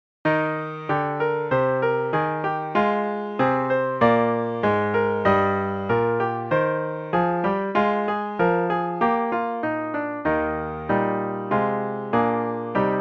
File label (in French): Trompette Piano